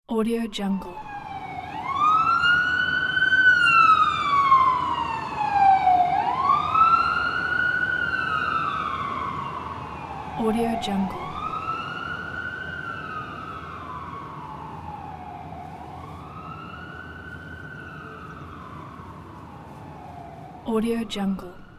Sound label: siren